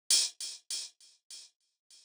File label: Hats, One Shots; Touch Loops; CosmicFunk